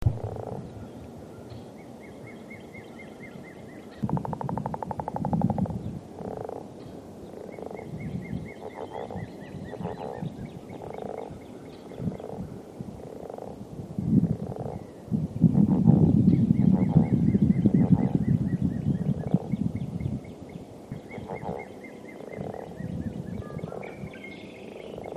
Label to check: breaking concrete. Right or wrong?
Wrong